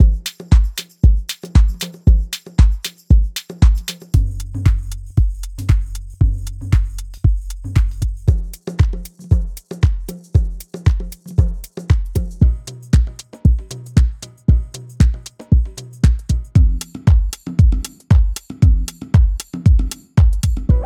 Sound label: organic house